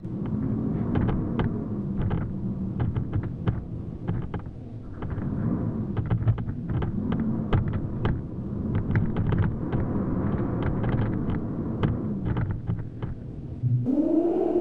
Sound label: wind howling with shutter banging; WINTER; JERRY APPS WINTER